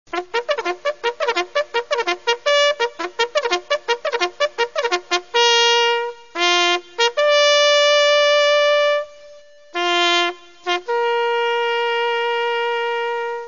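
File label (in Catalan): corneta, instruments de vent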